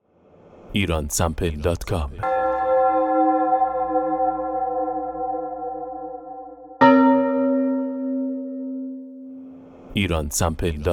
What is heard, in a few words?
bells, churchbells; product churchbells sound effect